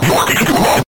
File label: taking too long fast; mission folder that appears when you have taken too long in a ghost house